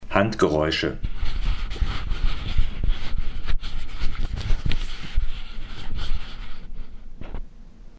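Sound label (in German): Olympus LS P2 Handgeräusche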